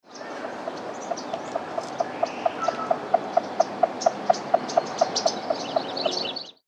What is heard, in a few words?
rluteiventris short